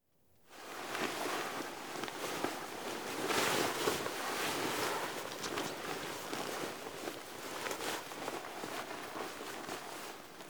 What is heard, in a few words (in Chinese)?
衣物沙沙响(冬服、室外)